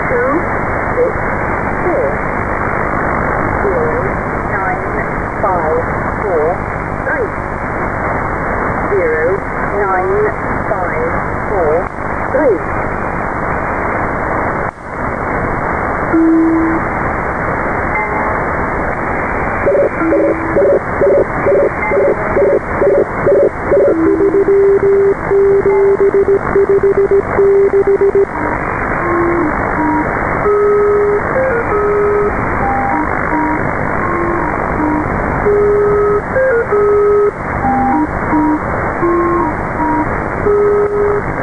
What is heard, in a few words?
cherry ripe intro